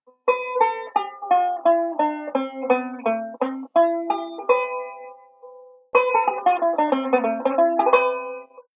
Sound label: Modal Sequence, banjo